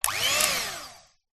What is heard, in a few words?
household food mixer hand held electric on off short burst